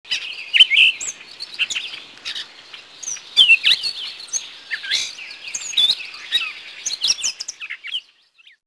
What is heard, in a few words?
catbird; birds